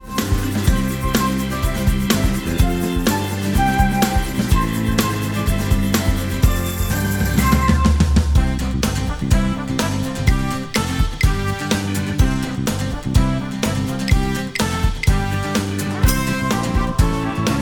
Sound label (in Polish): wersja instr; PRZYBIEŻELI DO BETLEJEM